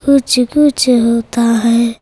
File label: CHILD VX C, Indian Traditions; USB Soundscan vol.45; AKAI